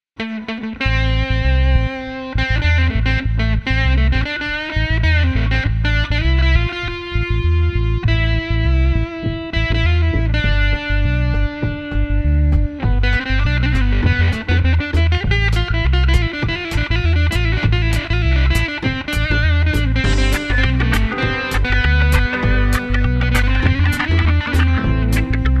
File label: dub